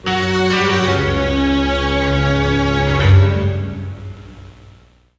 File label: Transition; knight rider